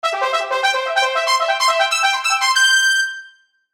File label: Musical Ascend Reverb; Miscellaneous Musical Sound Effects